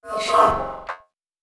uhchaupowhuh; Truth-Hz - 1-Hit KO Sample Pack

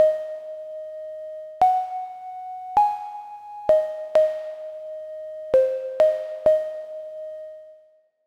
MELLOW SIMPLE LEAD; Melody Loops; TRUTH-HZ; Crowdsourced Sample Pack

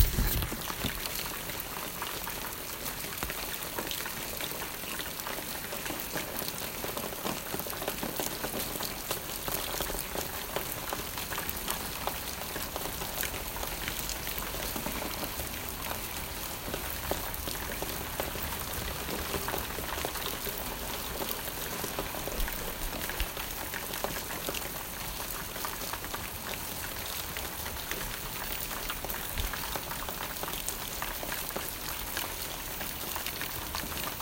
Rains, Soundscapes; Crowdsourced Sample Pack

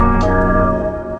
oohdeuuue; Truth-Hz - 1-Hit KO Sample Pack

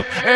h-heh; Truth-Hz - 1-Hit KO Sample Pack

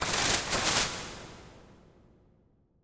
8 bit shake fx; Foley; TRUTH-HZ; Crowdsourced Sample Pack

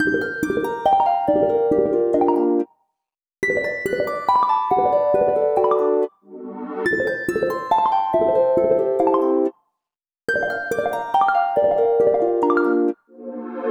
morning loop 140; Instrumental Loops; TRUTH-HZ; Crowdsourced Sample Pack

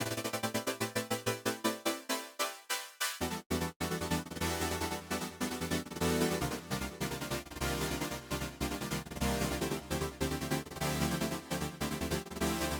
150bpm, Melody Loops; BluuLagoon; TRUTH-HZ; Crowdsourced Sample Pack

